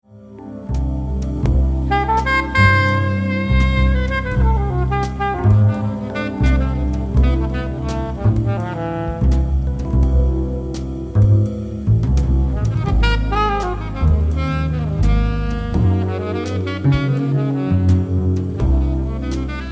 Song Alto

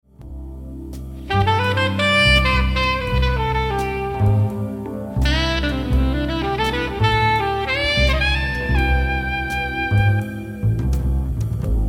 Song Alto; Meyer 80th

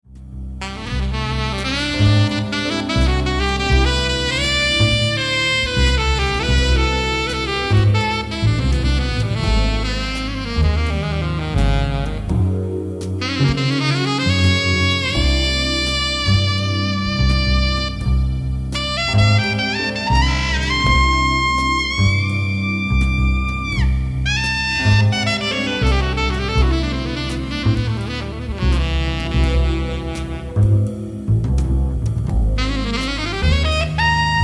Song Alto; Saxz HR7

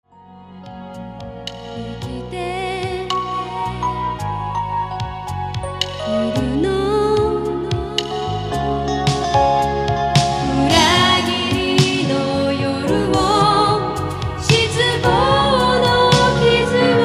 vocal

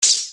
throw

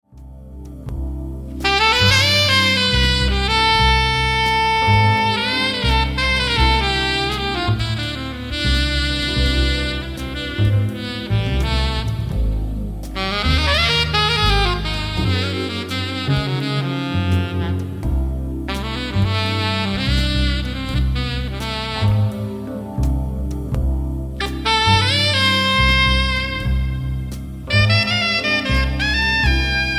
Song Alto; Saxz155

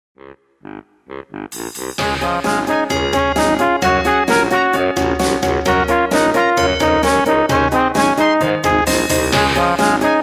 kids

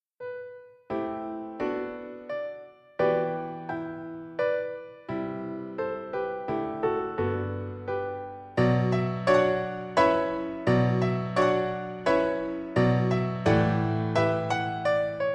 menuet; hydn

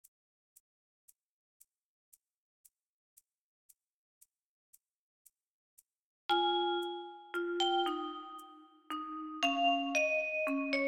Good Night Waltz

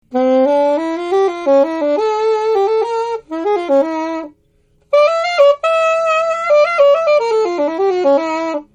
Bari Sop Met

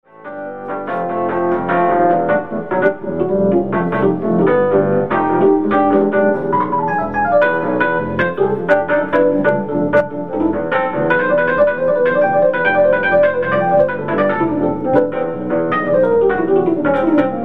Piano Solo

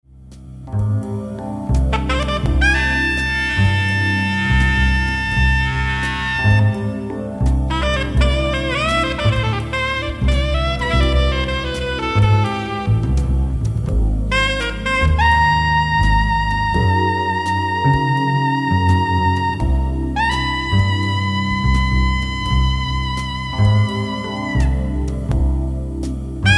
Song Alto; Saxz Met8

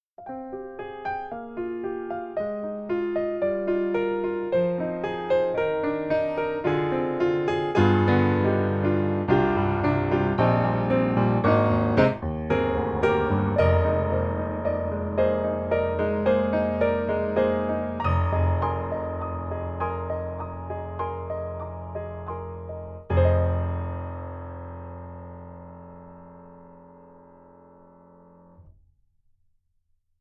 DRY

